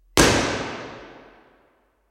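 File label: impulse response, symphony hall; usina del arte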